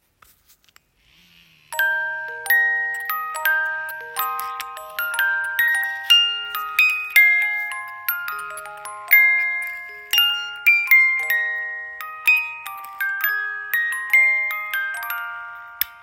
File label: Music box